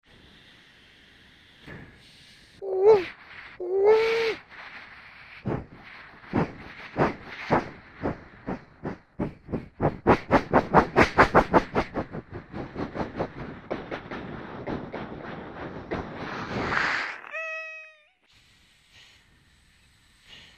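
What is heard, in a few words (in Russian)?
паровоз имитация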